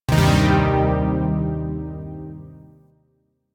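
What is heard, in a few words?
Shawzin Pentatonic Minor Chord; Zariman Shawzin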